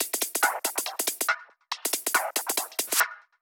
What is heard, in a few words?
drum Loop 140BPM Perc